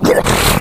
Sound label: shoved, infected, rage